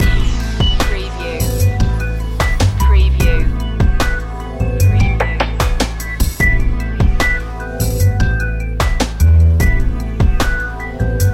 ambient skies loop

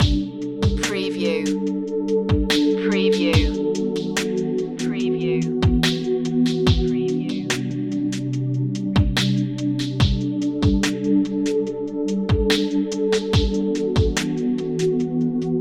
square drops loop

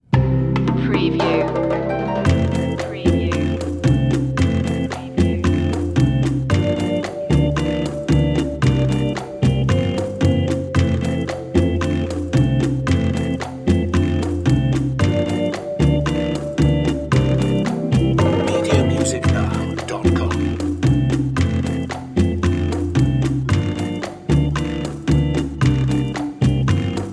cha cha no theremin lead

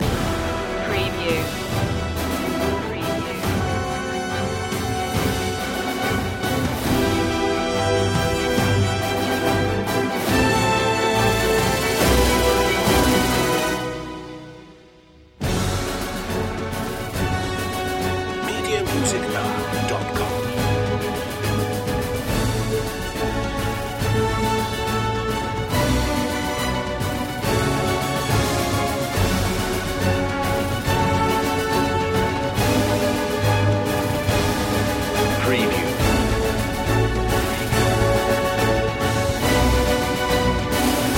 no choir

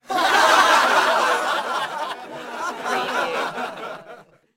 Crowd Laugh; Crowd Sounds / Crowd Ambience

